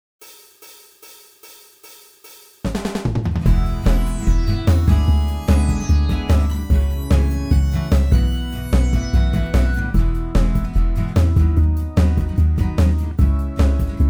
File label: Hello song Karaoke